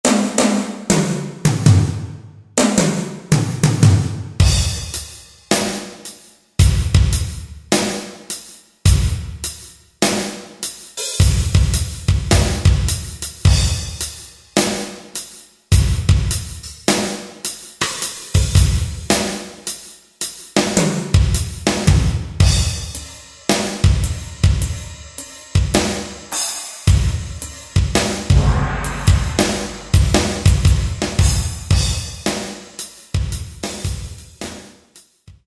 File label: Drum Set